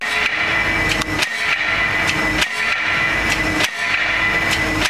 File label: Piling, Noise; EWEA Noise Workshop Oxford; Hazelwood Piling